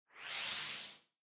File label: camera slow